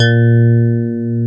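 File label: A#2 DX RHODES; Northstar - New Gold VOL-1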